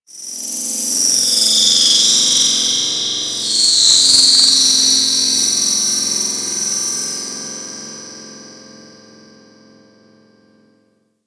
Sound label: Cephalon Tech Texture Small, Ambience